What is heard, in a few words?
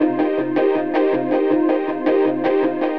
AMBNT 160; Ambient